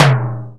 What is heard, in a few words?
SDX ROTO, TOMS; Greytsounds VOL-1